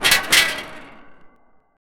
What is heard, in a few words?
metalic pipeline shaking